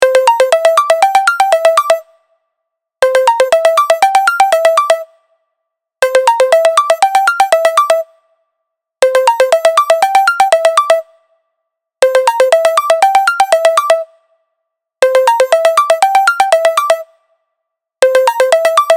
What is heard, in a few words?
phone ringtone ultra